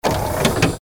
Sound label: machine burst